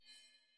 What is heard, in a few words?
level select chosen hover